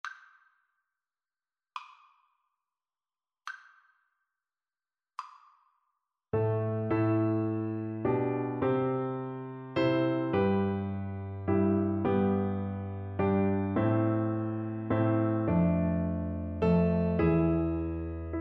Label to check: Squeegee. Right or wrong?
Wrong